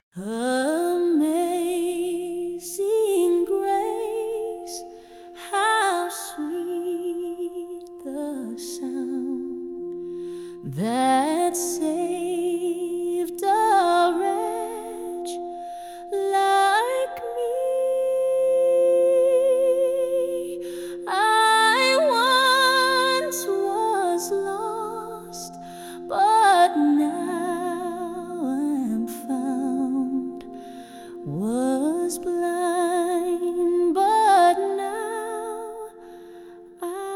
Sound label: Solo Female Voice, Hymn, Soft Organ; Amazing Grace